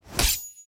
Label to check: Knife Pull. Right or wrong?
Right